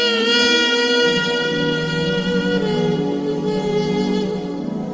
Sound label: saxophone; cowboy bebop